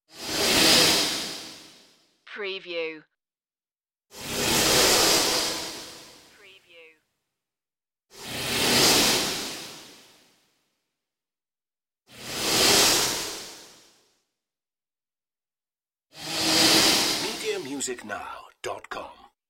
space hiss swooshes

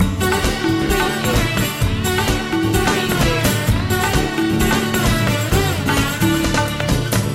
stomp loop

